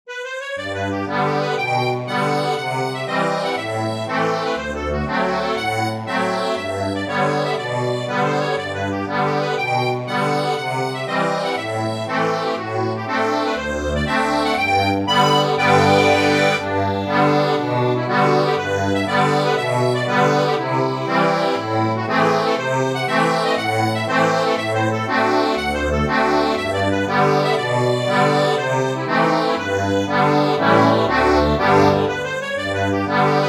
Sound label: accordion